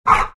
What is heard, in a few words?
grunt, greevil; courier